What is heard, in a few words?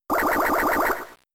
Bubble